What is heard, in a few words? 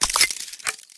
MECHANICAL; PMSFX